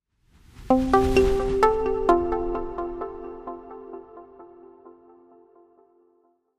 Angelic Welcome Notification

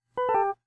voice act off; Instant Messaging; TeamTalk V 5.5 Portable

